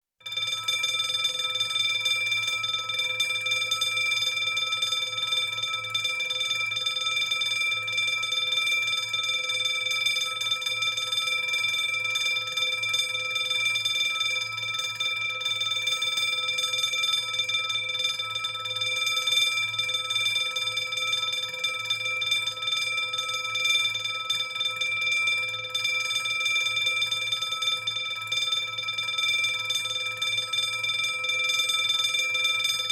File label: Burglar Alarm Bell; Emergency; BBC Sound Effects Library